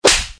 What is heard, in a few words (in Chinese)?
扇巴掌